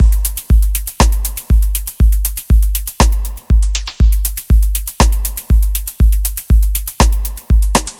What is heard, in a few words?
Dove Kit A, electro dub; сэмплы ударных